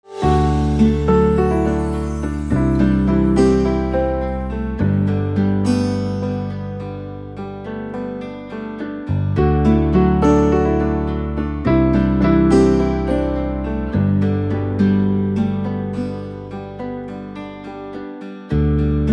Piano

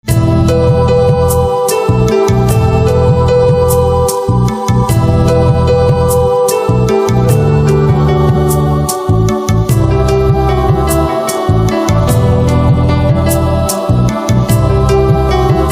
Piano

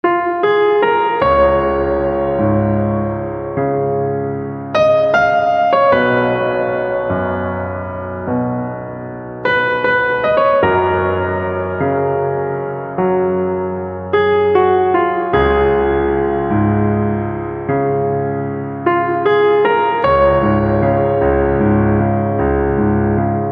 Piano; phir se dhurandhar the revenge

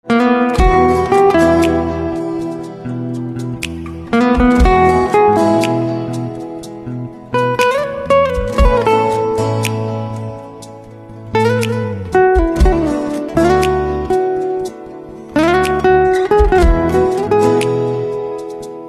Guitar

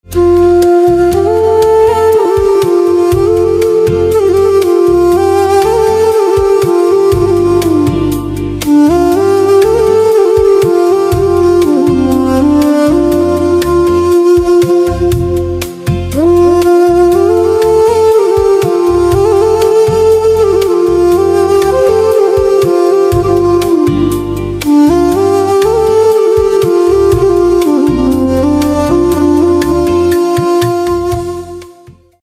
Flute

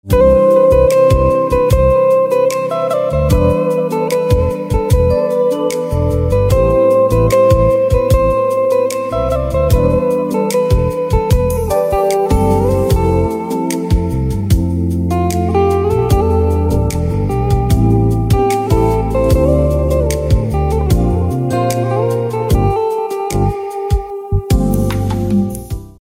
Guitar, instrumental ringtones

